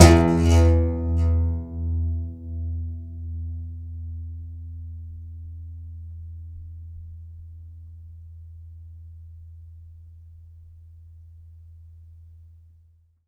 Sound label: C. Bass Balalaika, Plucked Strings